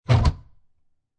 Cabinet Door Close Wooden

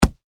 Sports Football Punt

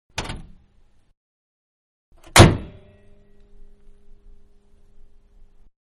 Small Car Hood Open Close Exterior; Cars (Sound Effects:Vehicles); Manhattan Production Music

